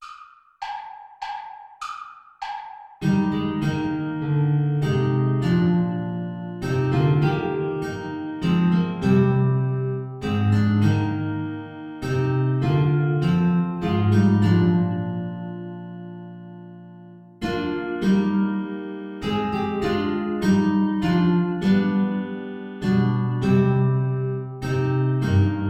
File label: play along without oboe